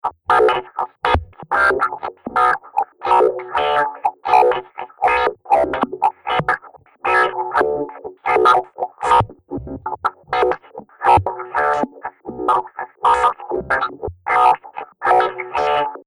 Crackle And Pop Chaos 120Bpm Loop; Magic Sound Effects; Looping Sound Effect

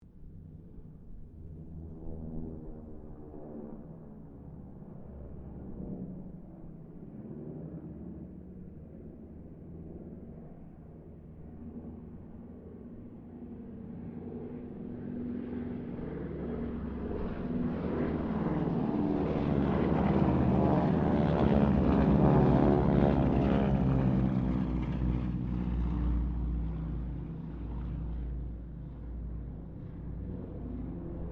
Harvard squadron 4 pass, stereo; Mixed aircraft squadrons; Airplanes; Sound Effects:Vehicles

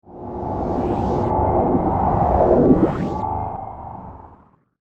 Escape From Alien Goo, science fiction

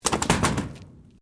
Bricks Metal Movement; Material components for constructing more complex sounds

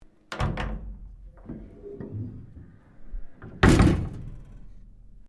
Door Waterproof Close, sounds onboard; Boats; Vehicles; Sounds onboard HMAS Castlemaine

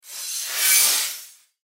Sci Fi Transform Evaporation Disintegration

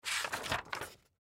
Newsletter Turn Page Paper Cardboard; Office; Blastwave

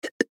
Human Hiccup, Hiccup Male Ten Yrs Boy; Human Hiccup Male Ten Year Old Boy; Hiccupping; Sound Effects:Human

